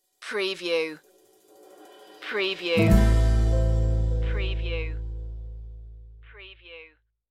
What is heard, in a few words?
natural stinger